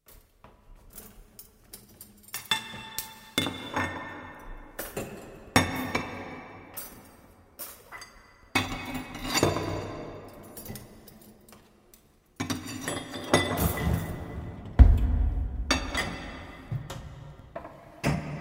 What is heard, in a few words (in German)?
Geschirr; bauphysik